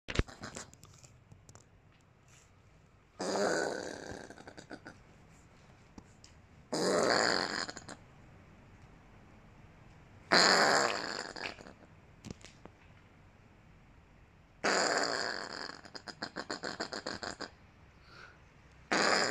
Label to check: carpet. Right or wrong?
Wrong